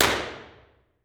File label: Lecture Hall, Reverb; 40x70x30ft; 10ft; FL Studio 9; Fruity; Edison